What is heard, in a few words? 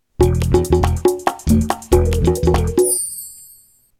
congas